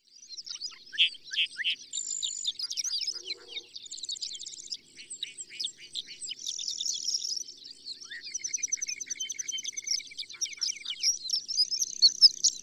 Horned Lark

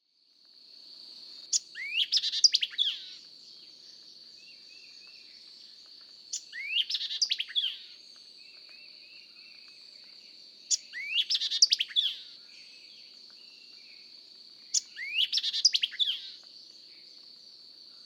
White-eyed Vireo

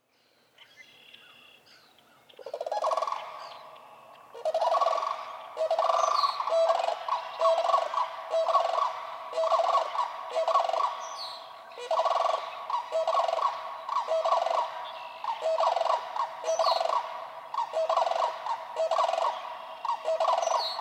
Sandhill Crane

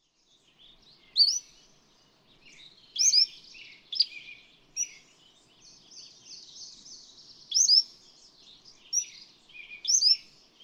Cordilleran Flycatcher

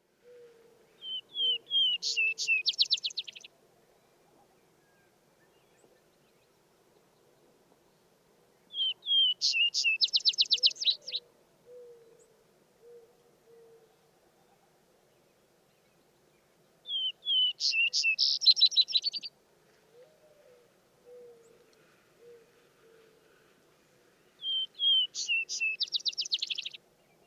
Vesper Sparrow

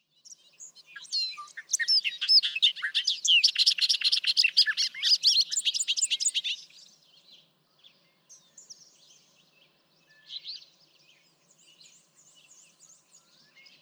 Bobolink

